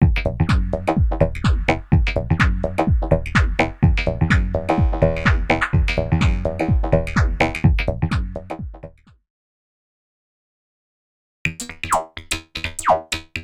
LFO 126bpm; X-Static Goldmine 5

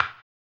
HAMBONE; ILIO; Vocal Planet VOL-2; Blues & Soul

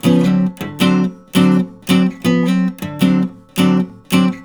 RIFFS, Blues Guitar; ILIO; Fretworks

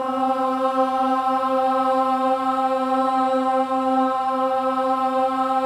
BOYS AHS, Symphony of Voices

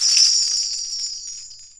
BELLTREE; ILIO; Synclavier Percussion 2; World & Orchestral

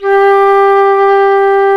flute, C flute

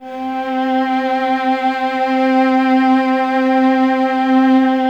String Ensembles, Violas; C 4; Miroslav Vitous; LINKS ORCH

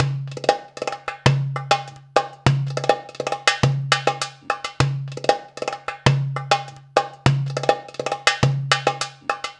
PERCLP, ArabianTraditions; USB Soundscan vol.43; AKAI

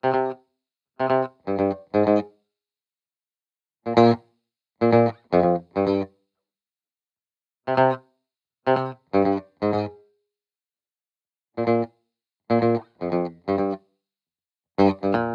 Guitar Bpm125 Key C min, 70s Guitars; Fox Samples - Back to the 70s Guitars

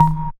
Plip, Sampled Notes; Zero-G Jungle Warfare 1, 2 & 3

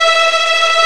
TREMO VIOL; E-MU Formula 4000 Series Vol. 5; Protozoa; Proteus2 Instrmt

